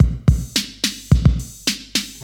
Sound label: 108 bpm breakbeat a sharp key; drum loops